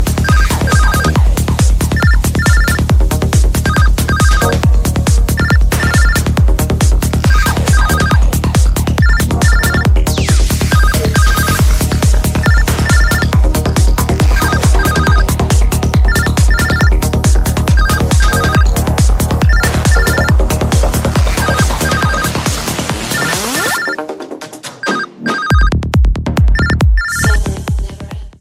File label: lg w30 pro ringtone